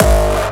Hard kick; osu

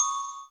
Bells

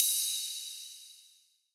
Drum Main G1 Strong

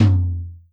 TOM; osu

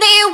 vocal

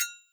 cowbell high pass

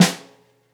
snare

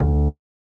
bass D3

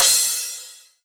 crash

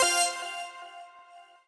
normal hit normal

